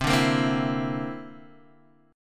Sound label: D 7th Suspended 2nd Sharp 5th C